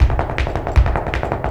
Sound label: Flamenco, ZAPATEADOSOL